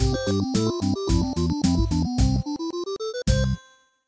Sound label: intro song; toystory; hamm